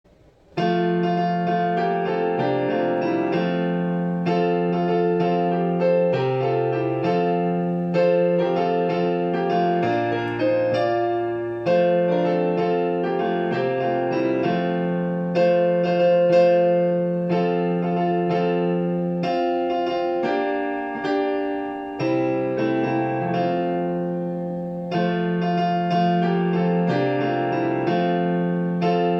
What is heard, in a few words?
Piano; Where Are The Nine